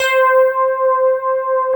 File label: D PF C 3